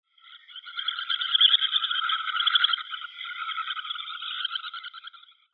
Sandstrandlaeufer Calidris pusilla, Vogelstimmen

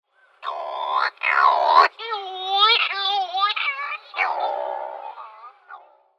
Gelbschnabel-Sturmtaucher Calonectris diomedea; Vogelstimmen

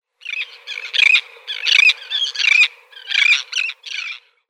Rueppellseeschwalbe Sterna bengalensis

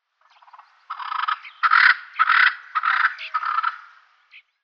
Doppelspornfrankolin Francolinus bicalcaratus; Vogelstimmen

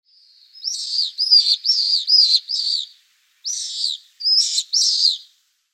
Fahlsegler Apus pallidus; Vogelstimmen

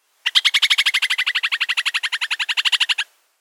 Praerielaeufer Bartramia longicauda

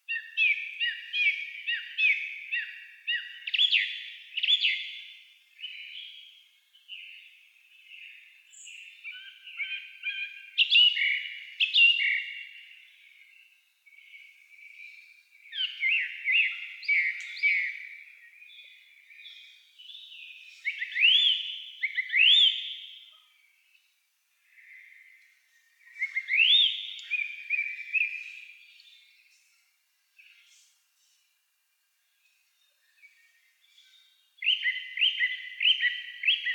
Singdrossel Turdus philomelos; Vogelstimmen

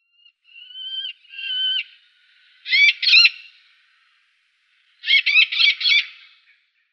Eleonorenfalke Falco eleonorae; Vogelstimmen

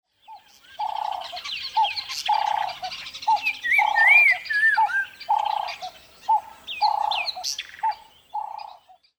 Palmtaube Streptopelia senegalensis

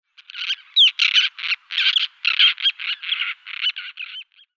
Rosenseeschwalbe Sterna dougallii

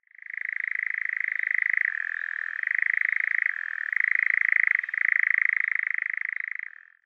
Ziegenmelker Caprimulgus europaeus, Vogelstimmen

